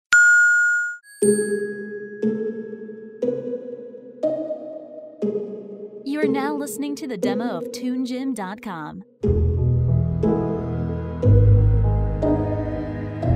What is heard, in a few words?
bubbles symphony